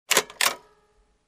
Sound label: Automatic Stamper